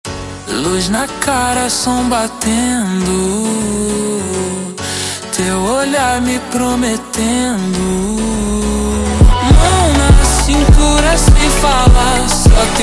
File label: Montagem slowed